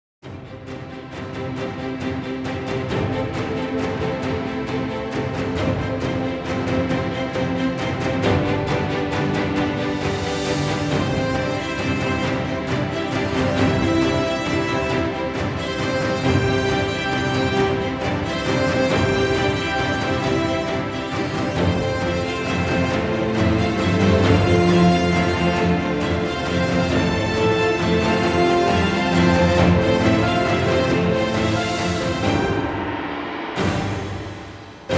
Sport Inspiration, Background Music

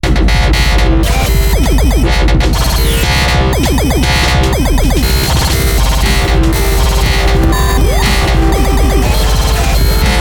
electronics

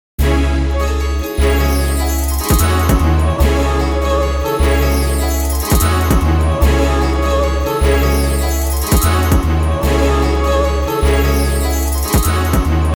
Trap Concerto

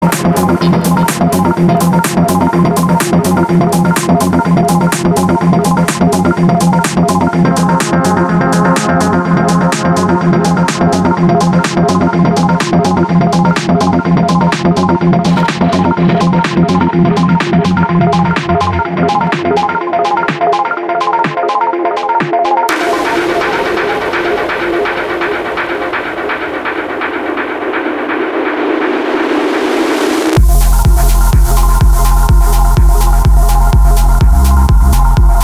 Deep techno

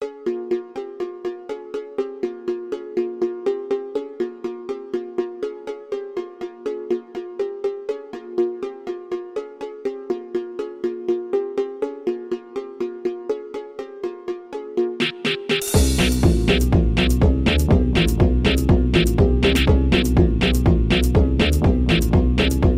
house music

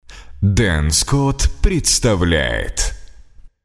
Jingle

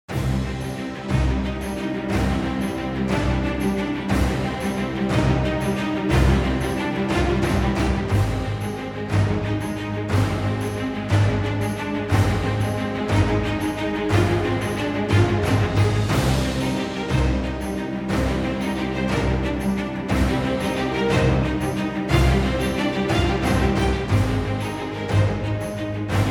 Inspiring Epic Background Music

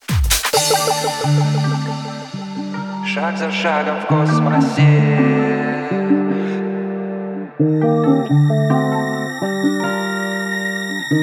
Speed Garage Remix

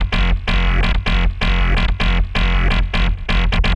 Bass

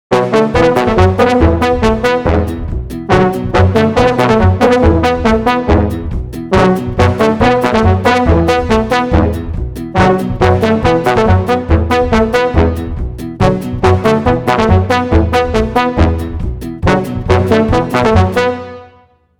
iphone balkan ringtone

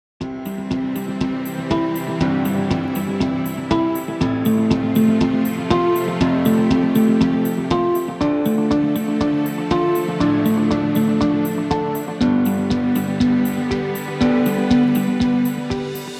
Positive Corporate Background Music

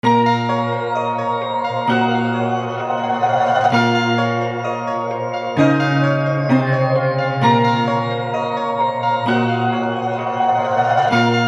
beat

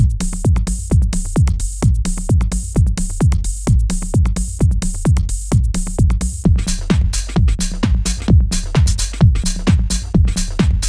Latin trance music

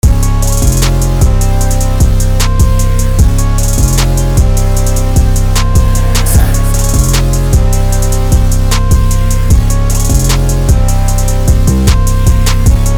152bpm

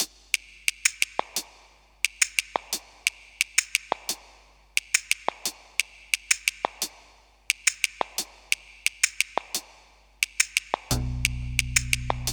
Bit

